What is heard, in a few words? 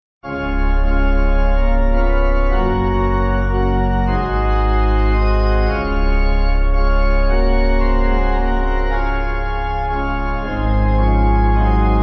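Pipe, hymn backing; O God Of Life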